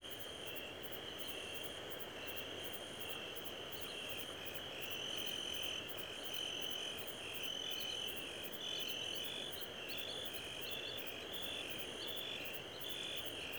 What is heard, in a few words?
chatter